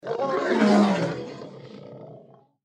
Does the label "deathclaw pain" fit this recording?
Yes